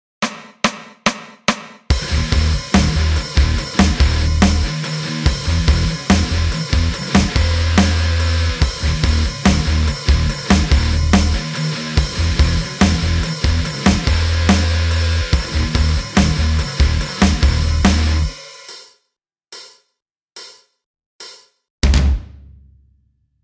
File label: backing 143bpm; marko pavic; lick of the week no 25